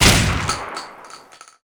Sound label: SAIGA; GOLD